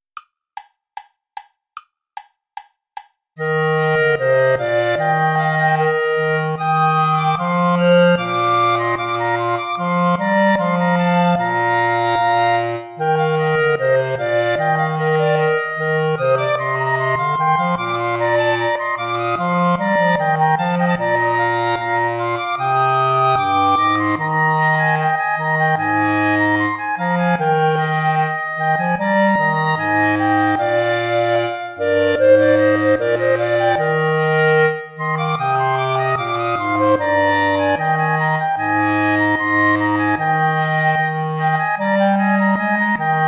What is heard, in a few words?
ballet play along without clarinet; praetorius michael; ballet iii